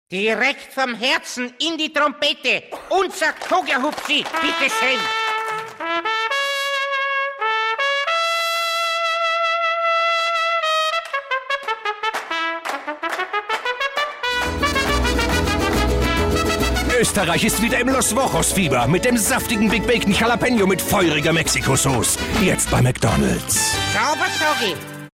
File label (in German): Trompete